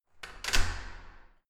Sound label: entrance door close normal